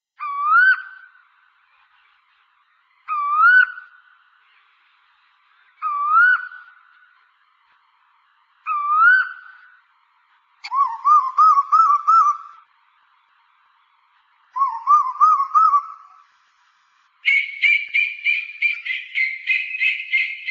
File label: bangladeshi koyel; animals